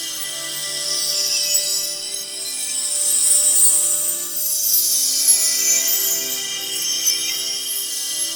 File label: Shawzin Prop Loop; Warframe; Duviri